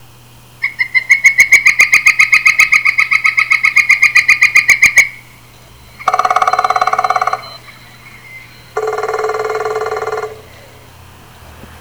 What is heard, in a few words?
Northern Flicker